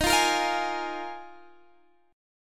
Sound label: D sharp Suspended 2nd Suspended 4th D sharp Guitar Half Step Down